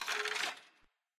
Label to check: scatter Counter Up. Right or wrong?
Right